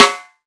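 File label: club Snare; Roland SPD-S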